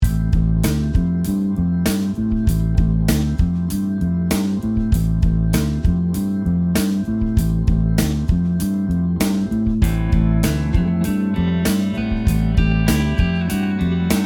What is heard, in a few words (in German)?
Rock Bass Pentatonik; rockige Bass Riffs